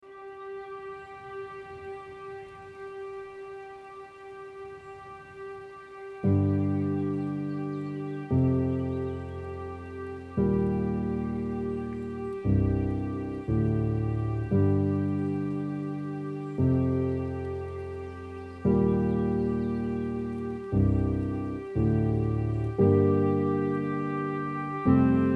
night Rain; ВидеоМОНТАЖ 23.0; ams music library

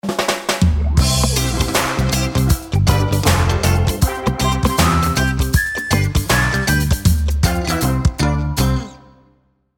reggae segue; ВидеоМОНТАЖ 23.0; ams music library

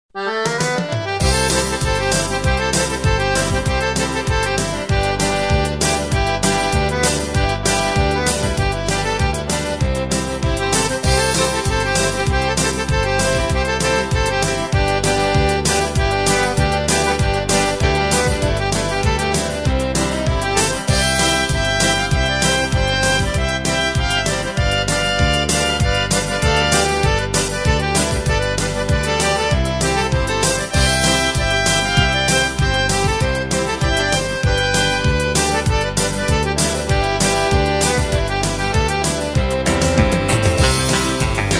cajun country; ВидеоМОНТАЖ 23.0; ams music library

